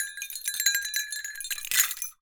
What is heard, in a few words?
Cowbell Chain, Percussion